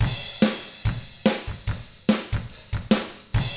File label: ROCK, Double Platinum Drums